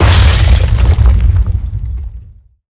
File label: Mortar Cannon Impact; Evolve; Behemoth